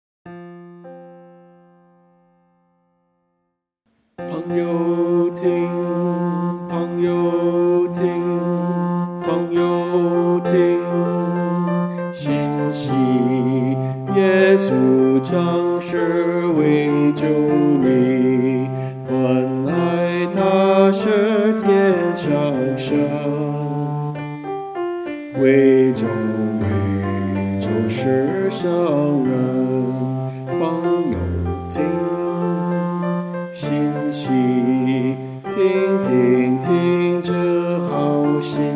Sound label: Bass